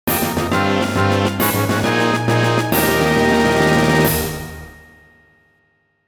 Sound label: Mini-Game Victory; Mario Party; N64